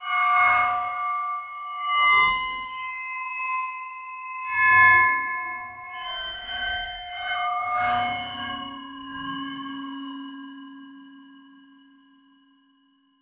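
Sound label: the crystal sound of a, bell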